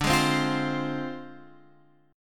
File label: F Minor, chord; Fm/D strummed; JGuitar